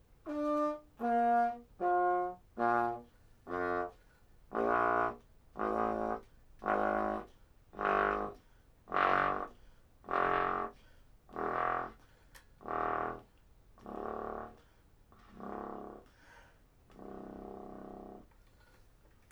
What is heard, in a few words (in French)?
trombone sourdine; controle inverseur